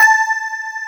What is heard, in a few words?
digi decay